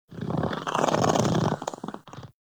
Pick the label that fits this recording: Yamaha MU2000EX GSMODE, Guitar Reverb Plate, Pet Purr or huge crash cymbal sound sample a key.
Pet Purr